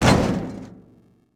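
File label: impact metal hollow; Cars; XBOX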